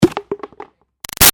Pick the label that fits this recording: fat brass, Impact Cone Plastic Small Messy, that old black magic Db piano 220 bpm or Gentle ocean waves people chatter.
Impact Cone Plastic Small Messy